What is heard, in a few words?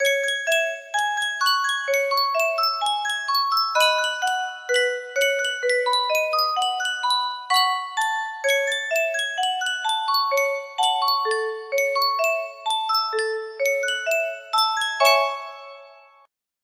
yunsheng music box